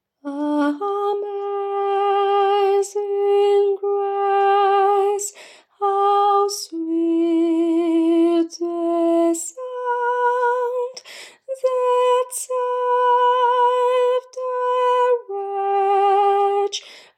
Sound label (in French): Amazing Grace alto